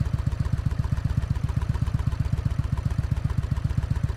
Suzuki VS 800 GL Intruder, Onboard, idle, Loop, Short; Cactuzz Sound